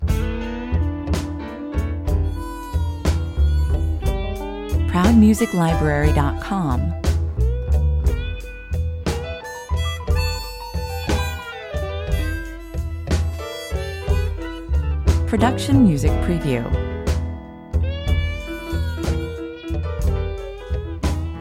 drunken bar band 60 bpm

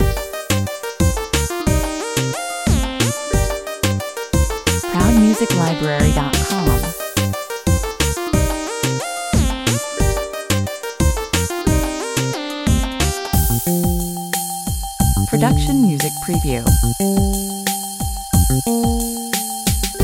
intense level, 180 bpm

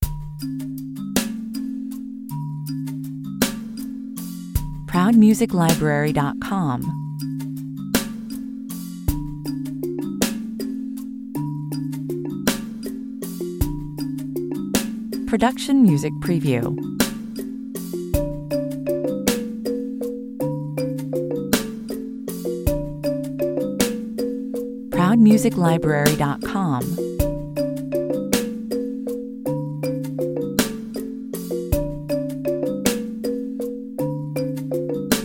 nature song 159 bpm

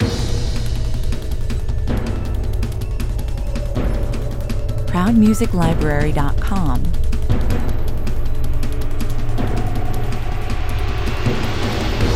sunstroke 160 bpm

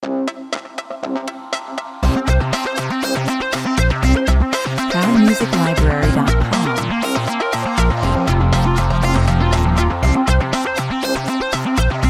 aggressive tendencies 120 bpm

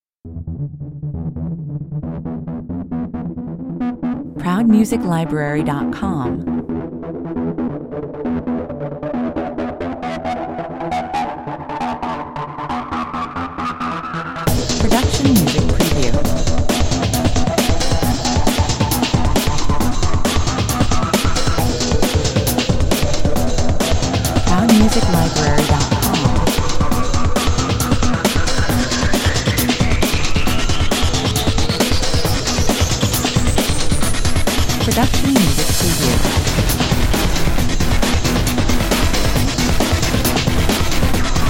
exhausted energy, 135 bpm

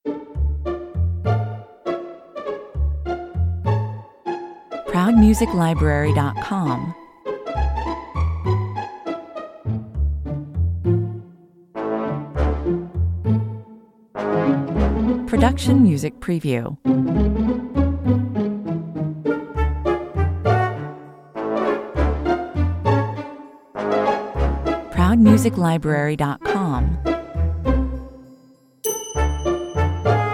pizzicato

